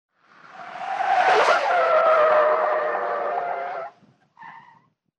rezkij tormoz i proskalzyvanie shin

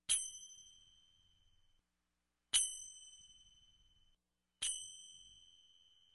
Kolokolchik muzykalny; Колокольчик музыкальный; Любой звук колокольчика, корабельного, музыкального, маленького, большого, одиночные удары

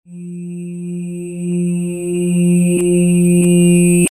bortovoj kompjuter na kosmicheskom korable

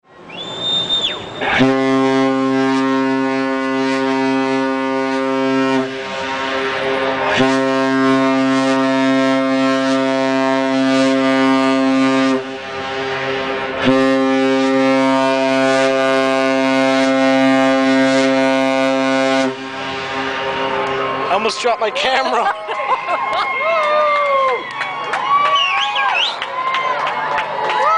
vstrecha okeanskogo laynera layner gudit

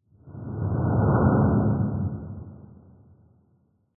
zimnij; Звук ветра; Звук ветра для перехода в видео или для монтажного перехода в аудио монтаже

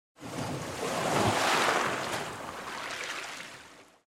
volna s otkatom

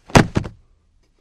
na pol kak shlepok ob pol

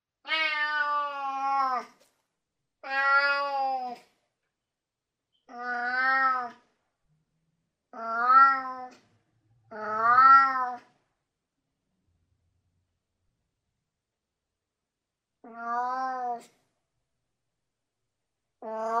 trebovanie

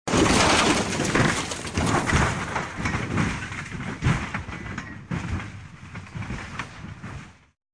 Avtomobil padaet v obryv

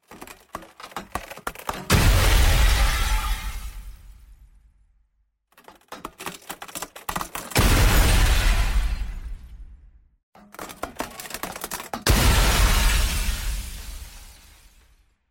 tresk i obrushenie tjazhelogo stekla

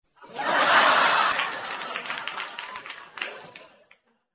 smeh; смех для ситкома; Звук смеха для монтажа

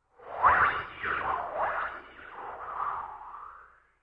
Raskruchivaetsya v vozduhe

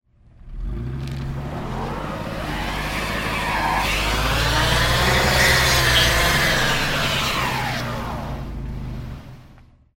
zvuk probuksovki avtomobilja

